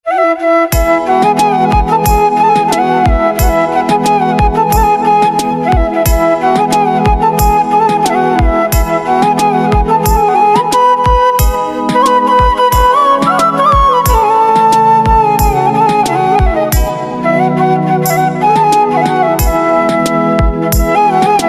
Flute

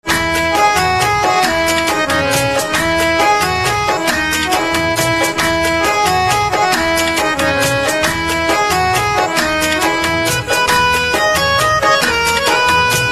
Harmonium

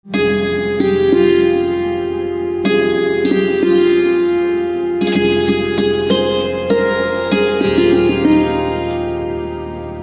Santoor Instrumental Ringtone

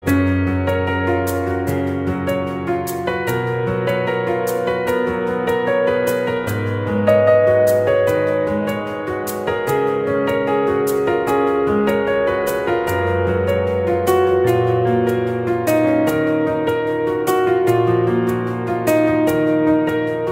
Piano

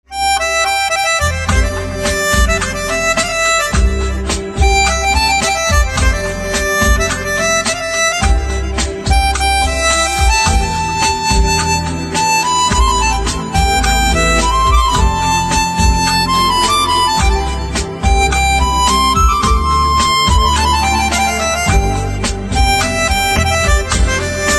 Pianica

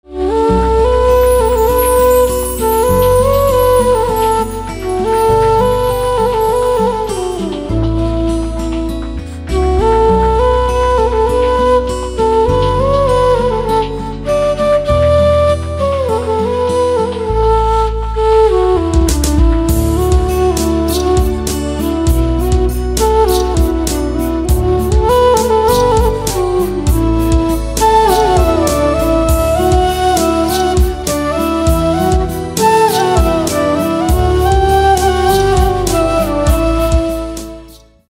Flute

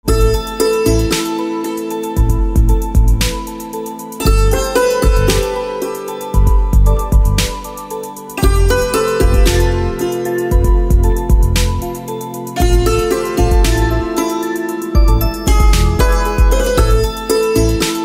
Santoor, instrumental ringtones

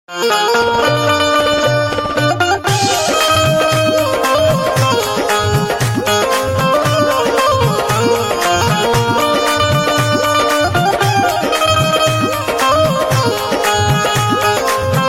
Banjo

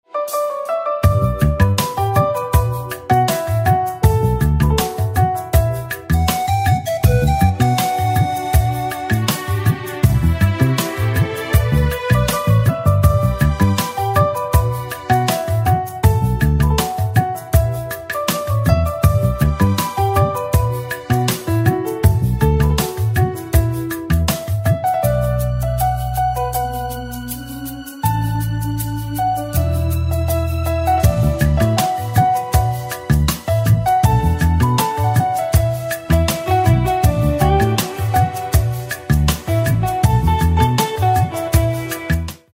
Piano

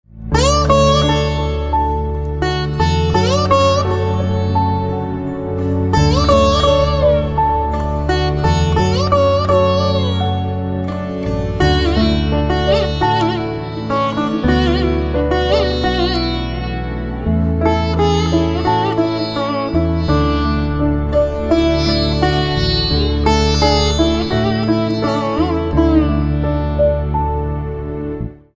Veena

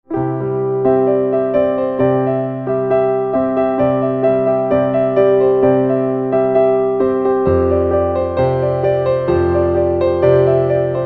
Piano

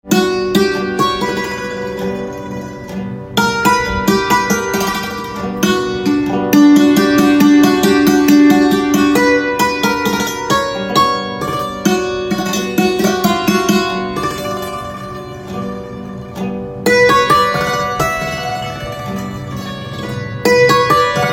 Santoor

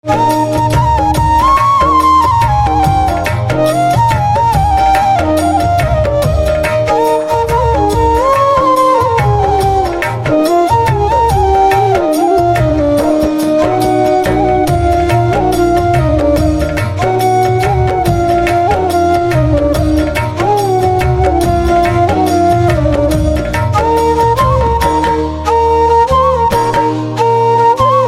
Flute

